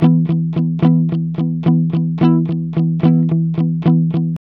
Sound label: ROCK E 110, Vintage Blues Guitar; USB Soundscan vol.22; AKAI